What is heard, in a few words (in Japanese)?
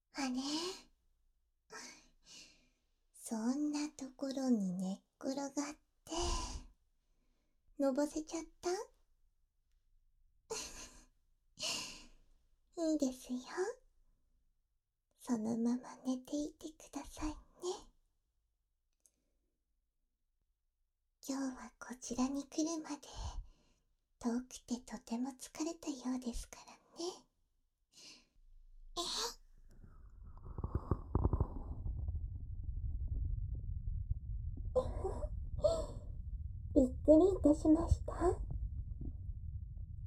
お耳の色々マッサージと癒しの耳かき